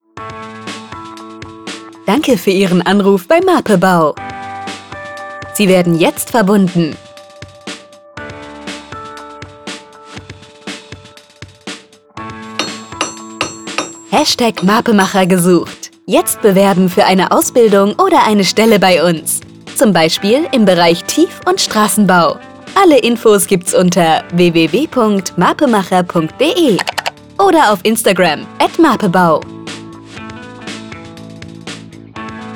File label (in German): Warteschleife